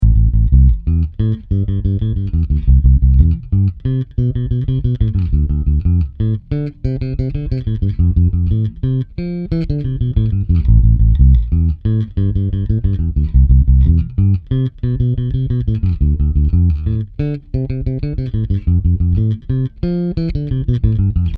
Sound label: Peavey Headliner 1000